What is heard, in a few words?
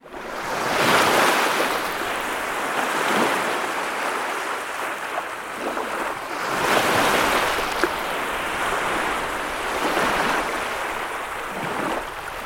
sea waves loop